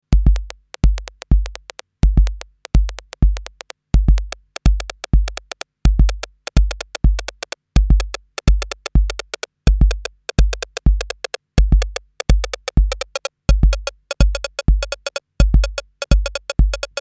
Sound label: TT 606 Rimshot Tone